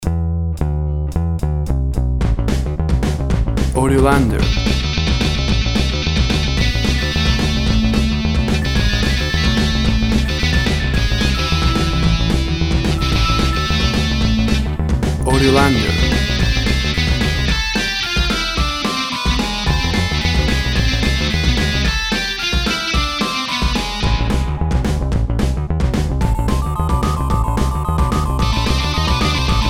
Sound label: Purple Effect, Alternative rock, Persecution, escape